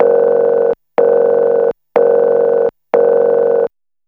Dial, Fairlight CMI IIe

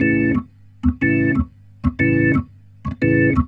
Roots Reggae, B-3; Sounds Good

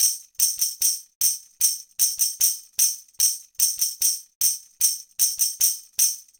Tambourine; Sonic Foundry (Sony Creative Software); Joe Vitale Latin Percussion

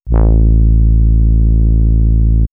Swirls Horn Low, pads; X-Static Goldmine

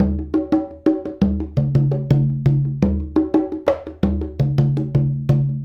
Congas; Essential Percussion

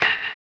HAMBONE, Vocal Blues Soul; Spectrasonics; Planet

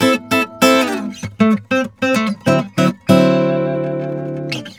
GUITAR SAMBA; Best Service ProSamples vol.14; AKAI; World Grooves

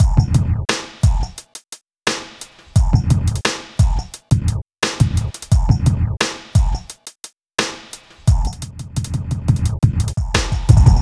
Drums, Hip Hop

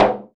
Dofs Nur Tak, Percussion; Roland BK-5

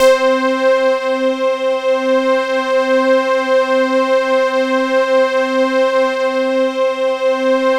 C4 prophet pad; Sound Gallery - Charged

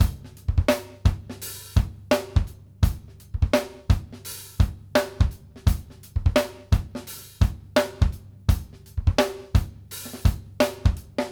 BEAT A, GOGO 84; Sampleheads; New York City Drumworks VOL-1

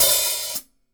Hi-Hat Open Stereo; Sonic Foundry (Sony Creative Software); Vital Drums

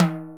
D&B Bongo; neuro

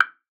WOOD B, PERCUSSION; Best Service ProSamples vol.42

